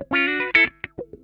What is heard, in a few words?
LICK, Funk Guitar; 90 s Sample CDs; Zero G